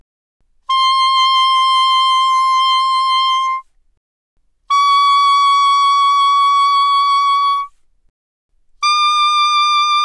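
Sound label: Soprano Sax Vibrato ff C6 Eb6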